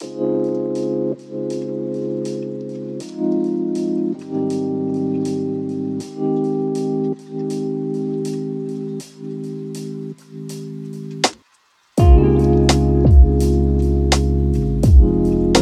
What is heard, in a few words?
lofi hip hop